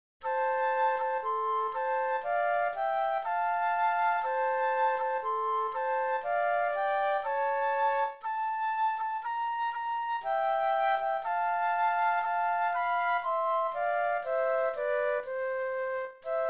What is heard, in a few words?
Flute Duet